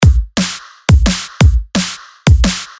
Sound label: loop drums; trajectory